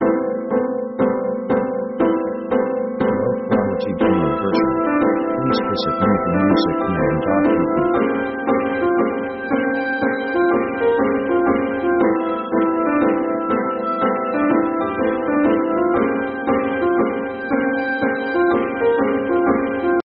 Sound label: orc fast marching sketch; orchestra themes